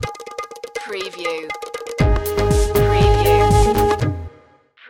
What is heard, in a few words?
bollywood stinger